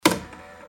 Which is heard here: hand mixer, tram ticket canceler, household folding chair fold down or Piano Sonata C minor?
tram ticket canceler